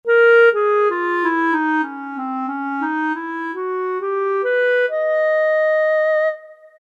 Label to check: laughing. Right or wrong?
Wrong